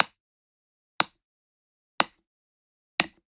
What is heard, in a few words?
Generic Click Wood Small; boom library; The Interface